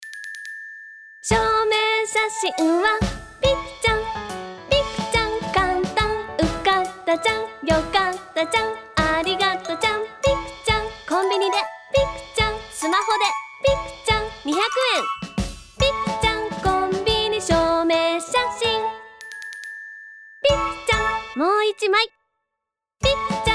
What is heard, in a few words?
song